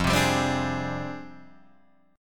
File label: F Minor 6th Add 9th F down Guitar Open D